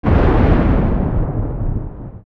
Explosion; secret of evermore

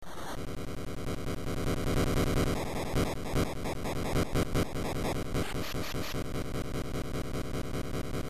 QUAKE

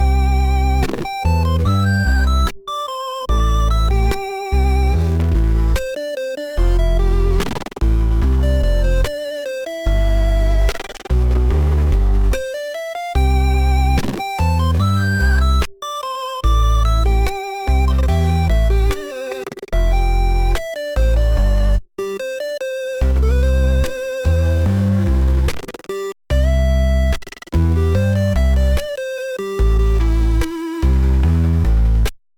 chiptune

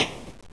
CHORP

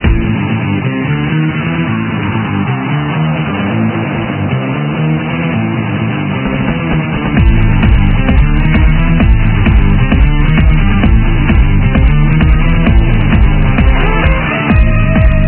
Bizarre Funk

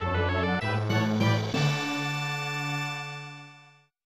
Special Item Fanfare; game ost; breath of fire

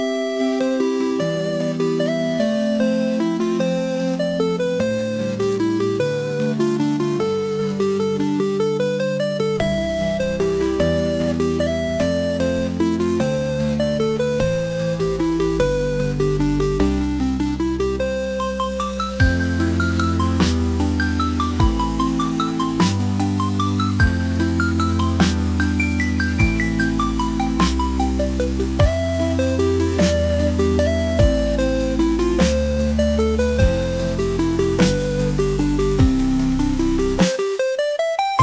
tune, chiptune

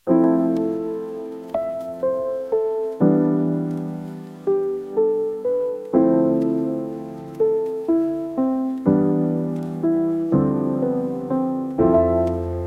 tune, chiptune

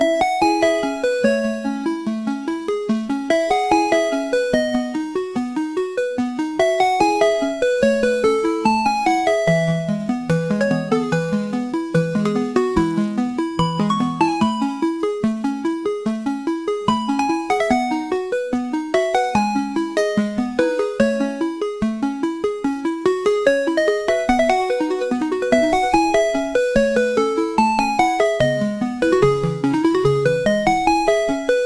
tune, chiptune

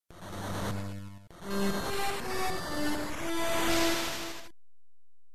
INTRO MELODY

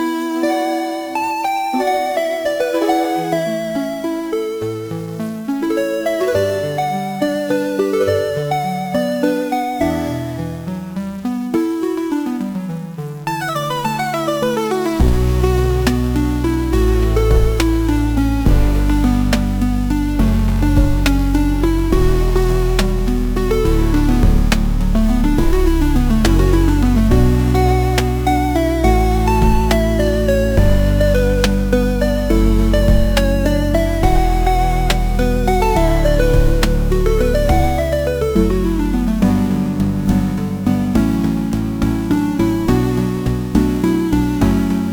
tune, chiptune

